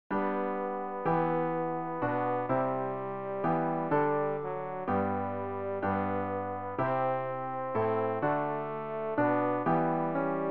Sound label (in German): tenor; Ref. Gesangbuch; RG 568: Wach auf, mein Herz